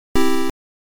Start-Up Sound; Oretachi Gesen Zoku; BurgerTime Music CD

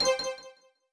Stage unlock; The Denpa Men; Android

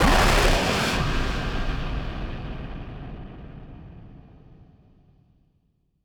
radio impact distortion burst

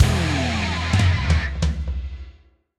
Rock Jingle Failure; Yousician; Android

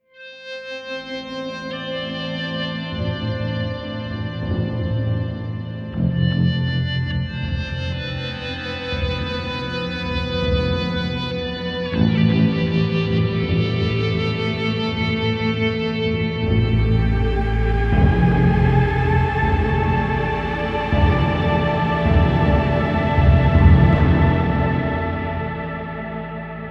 Mood Happy Success; The Long Dark

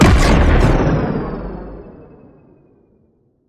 Sciweap Shot Large Gun Cannon Blast Parry

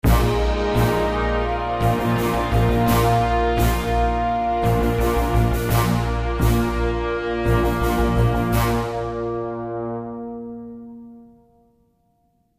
Downed Pilot Success; Counter-Strike Condition Zero

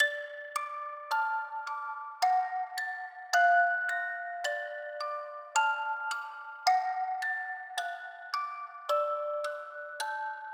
Playroom Loop

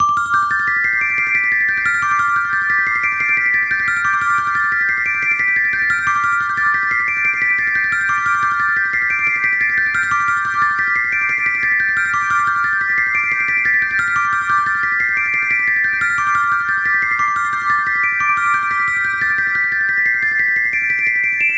BGM Snow; Happy Birthdays

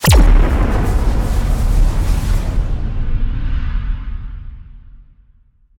Implode

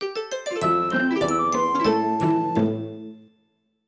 Jingle, Sleep; Sakura Samurai Art of the Sword; Wii; Sleep at Blossom Inn

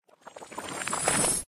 Prebooster Anim Buildup; candy crush saga gamerip

